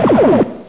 ufo beam

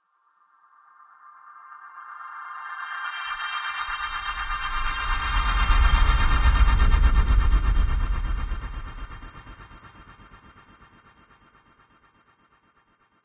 darkness humming

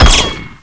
bradnailer fire; poke646

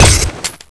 nailgun fire; poke646

